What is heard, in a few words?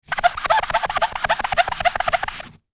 Dry Erase Board